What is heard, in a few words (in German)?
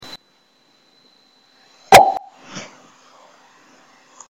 plopp